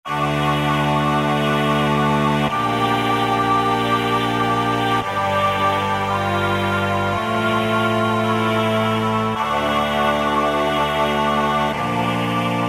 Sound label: VP Choir, Roland V-Synth; Factory Patches